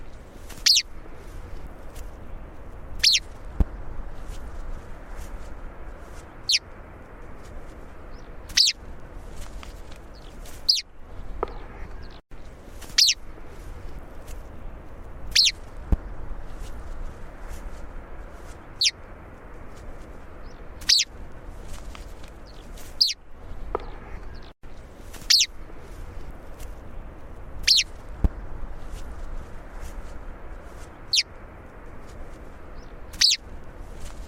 Richard's pipit; پیپت پا دراز

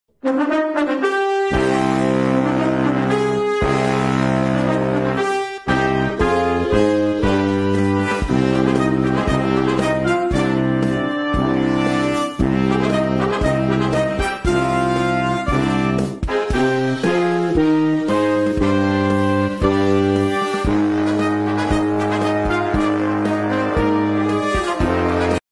Sousaphone

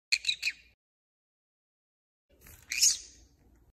Green cheeked parakeet; طوطی گرینچیک

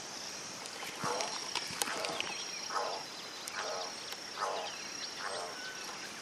Golden collared toucanet

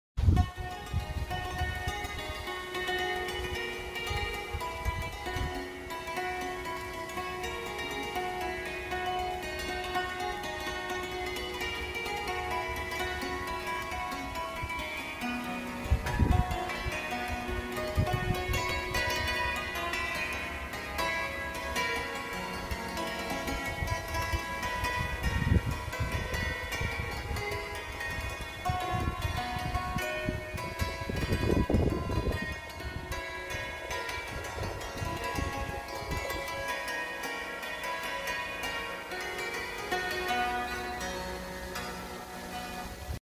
Cimbalom

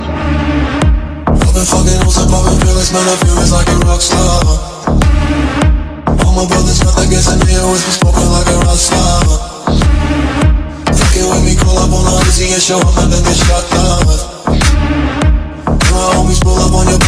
ringtone

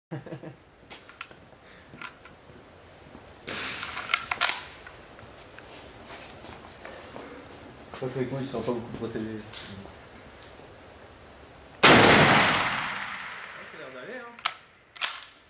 Lebel, صدای تفنگ; تفنگ لبل

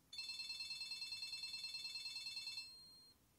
qtek ringtone

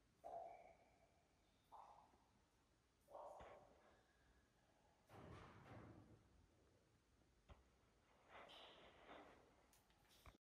basement

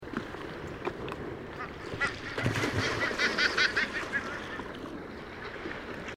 Brown booby; پرنده بوبی قهوهای

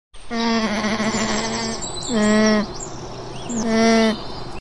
alpaca; آلپاکا

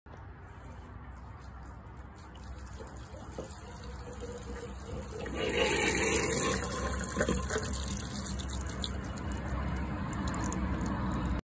water well; چاه آب

